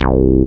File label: TB303; Best Service Gigapack 1; SYNTB303